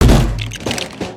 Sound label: cans plastic; destructibles